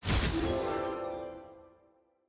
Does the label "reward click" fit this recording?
Yes